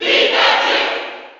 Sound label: Cheer; Pikachu; SSB4